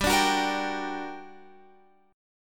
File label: G Minor Major 7th, down, Guitar, Open F; GmM7; strummed